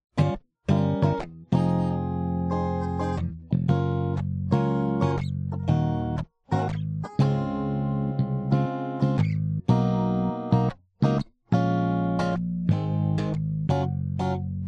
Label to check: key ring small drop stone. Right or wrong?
Wrong